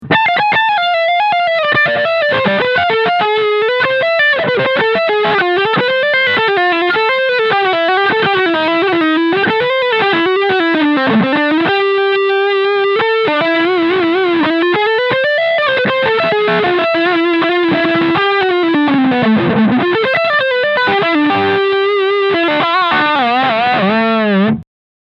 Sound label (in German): Hornet 30i Beispiel; Mooer